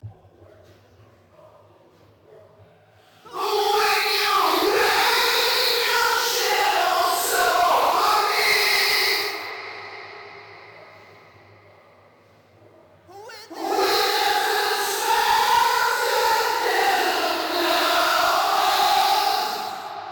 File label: insects clusterizer